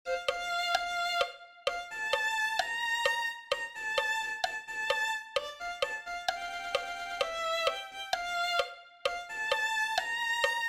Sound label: violin 1